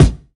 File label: BD, BASS DRUMS; EdgeSounds